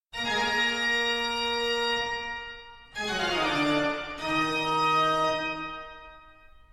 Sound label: toccata and fugue